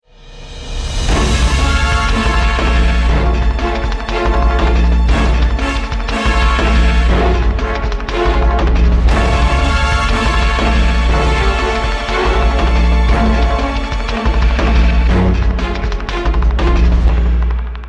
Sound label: start action, music